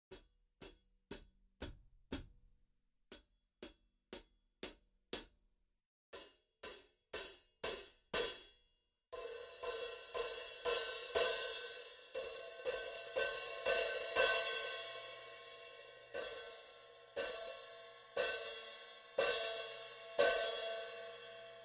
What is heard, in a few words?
High Hat 13 - Birch Rutes